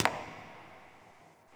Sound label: succubus step; queen girl